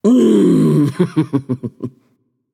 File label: notice; babayaga; smite